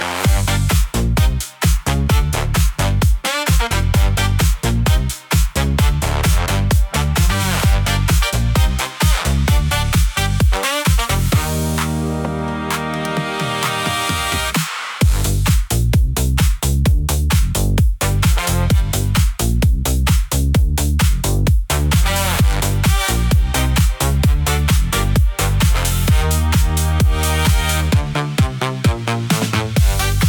electro swing pop

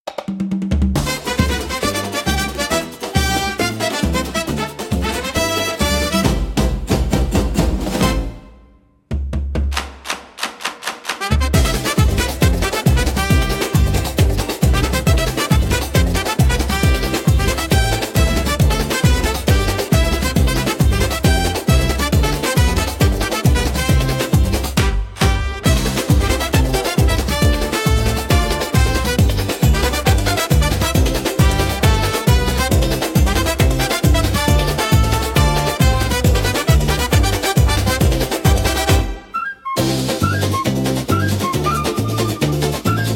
Carnaval Pulse, upbeat carnival; for festivals and parades

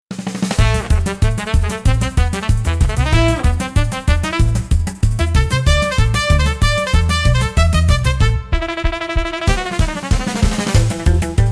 Carnival Stampede, upbeat carnival; for festivals and parades

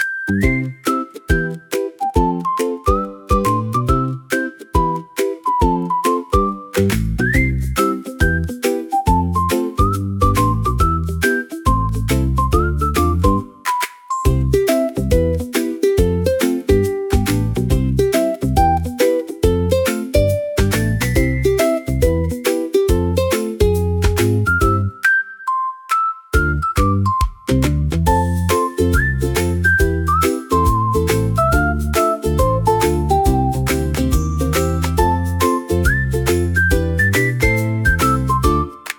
ukulele tropical for bright and light

